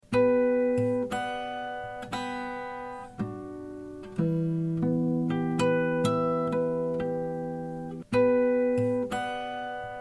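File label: Em 120 guitar harmonics impro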